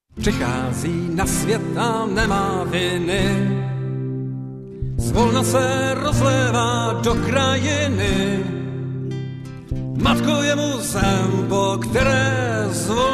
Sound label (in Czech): instrumentace